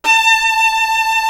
SOLO VIOLIN; East West; Global Sounds

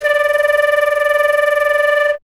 FLT FLTD, Flute; Miroslav Vitous - Solo Instruments VOL-2; Solo lnst. II

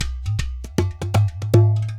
drm, 120, MIXED DRUM LOOPS, WORLD DRUMS; World Instruments 2

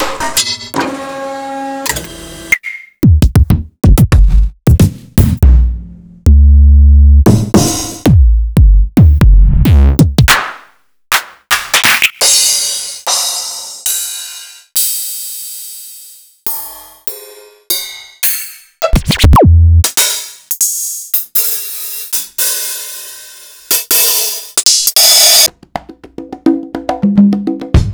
Korg Electribe ESX

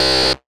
Dist, Distortion; Bitkits

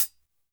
TING LP

